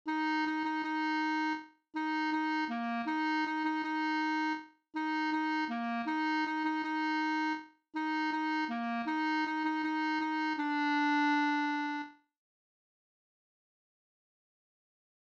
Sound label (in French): le roi lion Clarinette en Sib; musitheque